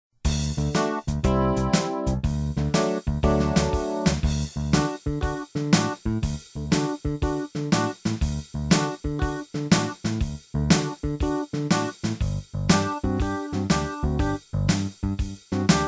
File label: g a blues